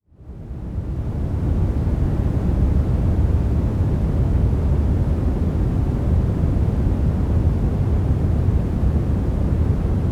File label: Ocean Of White Noise